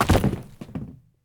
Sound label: body drop wood; Escape from Tarkov